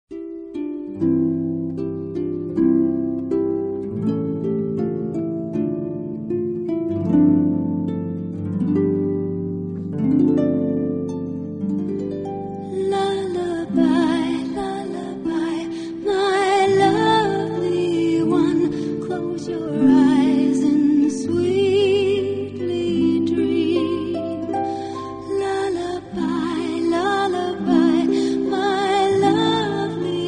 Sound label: LULLABY